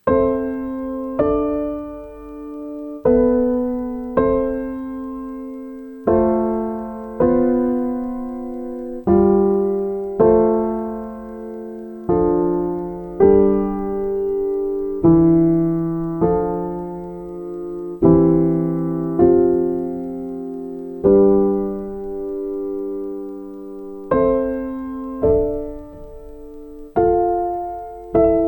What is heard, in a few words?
piano